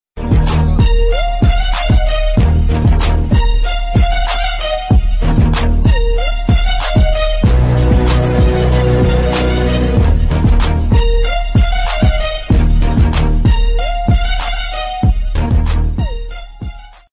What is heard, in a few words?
best rhythms tone; iPhone